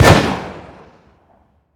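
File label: weapon mike203 fire player atmos int, launcher; gmod; viper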